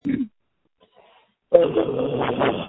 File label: Scream